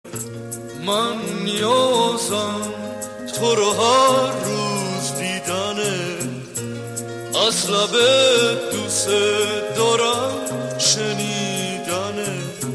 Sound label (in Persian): کوروس Ringtone; اجزای یک ترانه